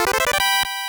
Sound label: Stereo Health Up